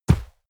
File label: horse step snow